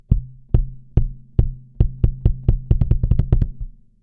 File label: Kick